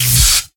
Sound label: lightning impact